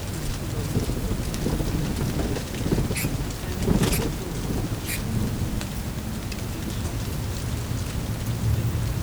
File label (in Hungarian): háromszor, denevérek hallható hangtartományban kommunikálnak; termeszetben